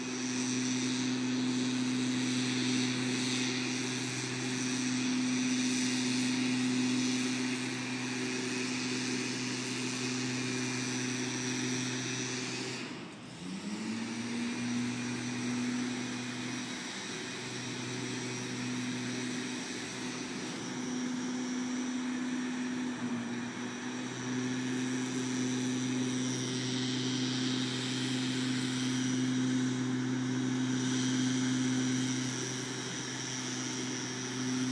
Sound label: leaf blower in barrow park